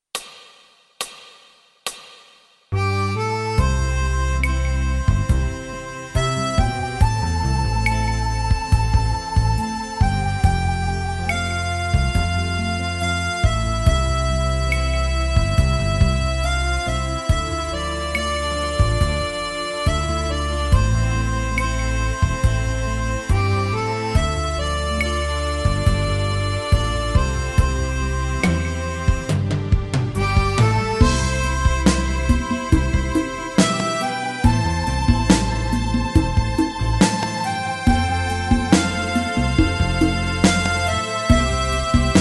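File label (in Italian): Fisarmonica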